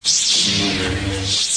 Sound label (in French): sabre laser